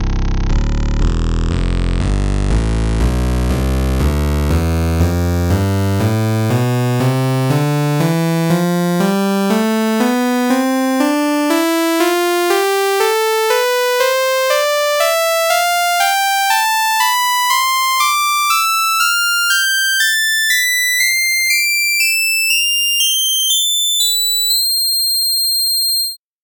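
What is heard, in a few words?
fifth sawtooth wave scale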